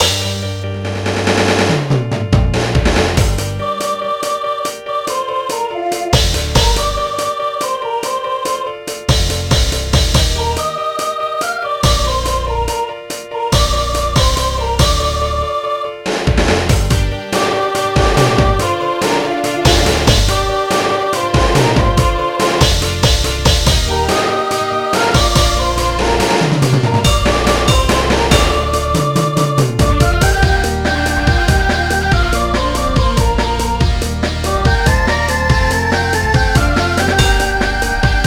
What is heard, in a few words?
band full; BGM; infinitefusion